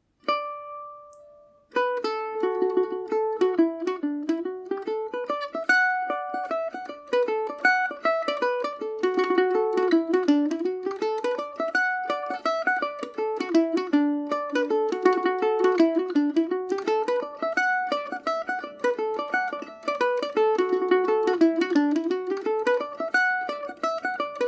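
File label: D major paris swing, irish mandolin; green mountain; The Green Mountain; Paris Swing Macaferri mandolin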